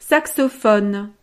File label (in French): saxophone